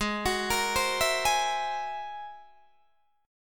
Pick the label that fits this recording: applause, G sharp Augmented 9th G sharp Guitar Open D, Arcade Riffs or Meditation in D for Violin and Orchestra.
G sharp Augmented 9th G sharp Guitar Open D